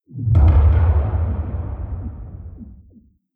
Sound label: supply land far; cstrike; pubg